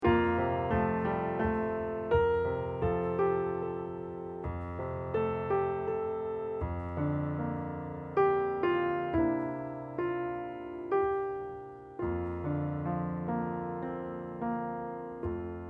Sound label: accomp